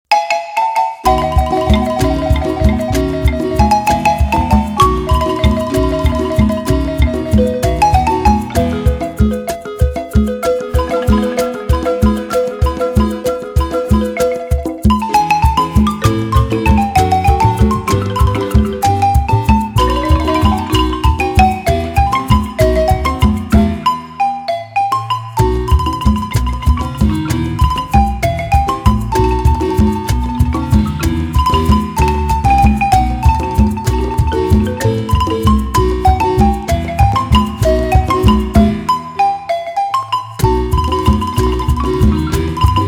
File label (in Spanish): tambito, marimba